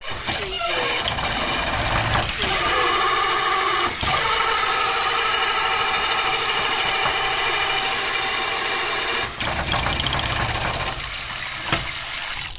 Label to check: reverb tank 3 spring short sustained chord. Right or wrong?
Wrong